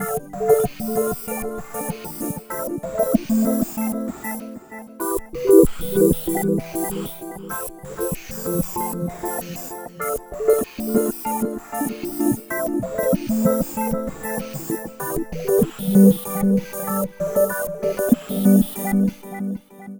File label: Whisper Echos; Dr. Fink's Funk Factory